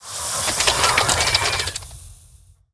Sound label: Spiderbomb